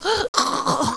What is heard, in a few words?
cut, player male